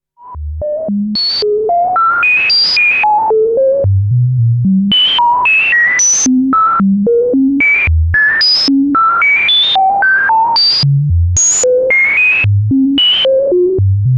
Moog Mother 32 Patch Bleep Bloop Noise

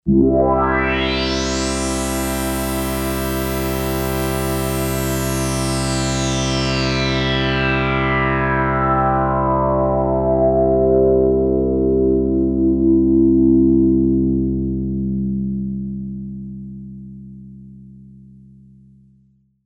Synthesizer Sweep

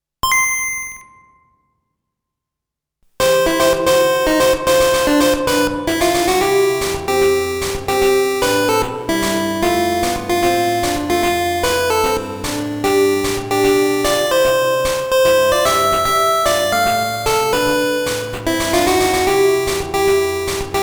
Sony DRE 2000 Game Boy Reverb Settings

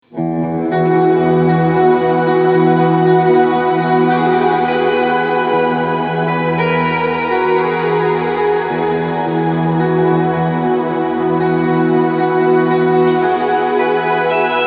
GFI System Skylar Shimmer

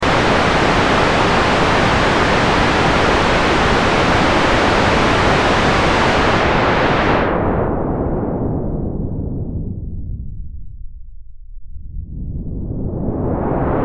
SH01a Noise Original LFO Sample Hold

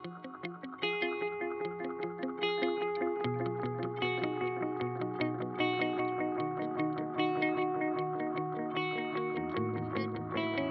MXR Carbon Copy

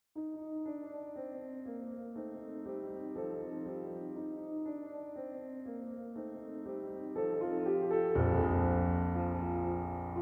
480L Echo Plate Mini Grand; Redline

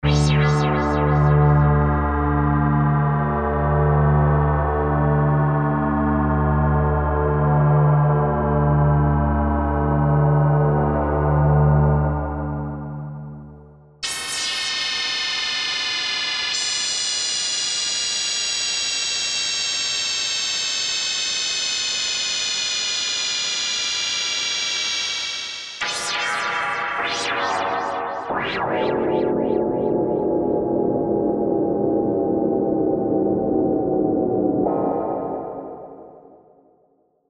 SX240 DUALMODE RING FX

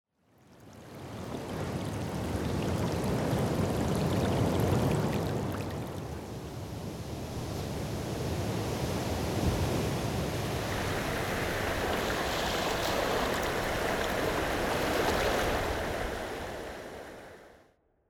Stings Textures Water FX; Natural Forces

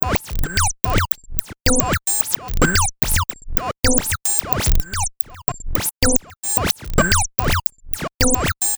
Analog Electronic Effects

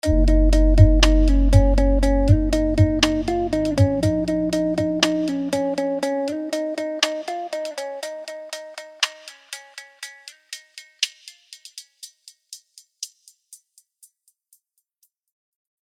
Sweeps; FilterShaper Core 2

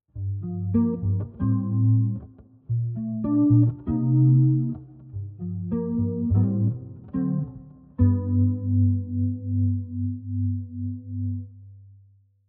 Chorus Reverb USB

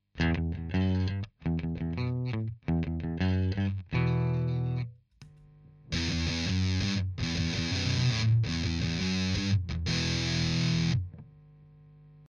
Bridge Sustain

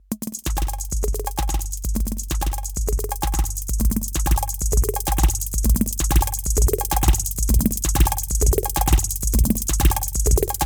Erica Synths Black Hole DSP2 Phazed Delay

